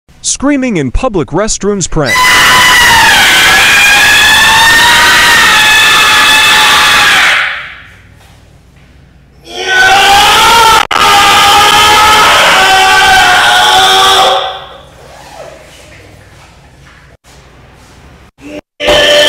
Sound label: screaming in public restrooms prank; loud sounds